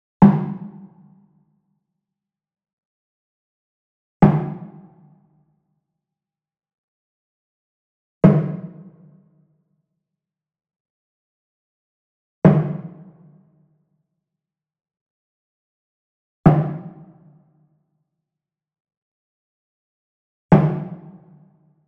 WOOD PERCUSSION Wood Drum Mounted Hit Beater Medium; boom library; Cinematic Strikes Construction Kit